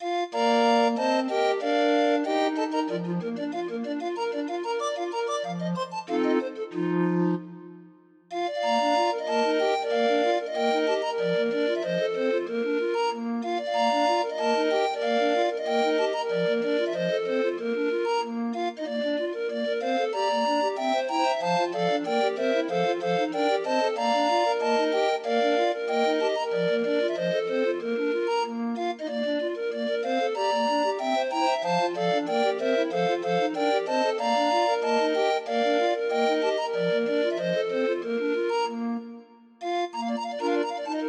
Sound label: Bluebells of Scotland, grind organ